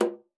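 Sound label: C#3, bass flute; Xsample VOL-2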